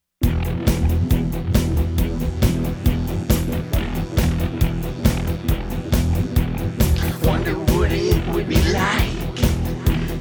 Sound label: Alternative Rock